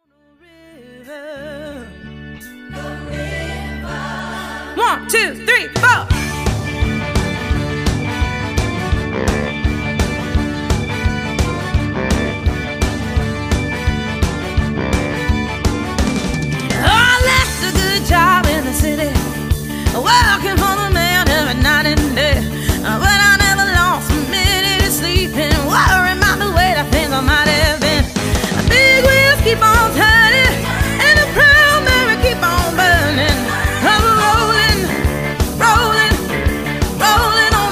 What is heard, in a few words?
Party Band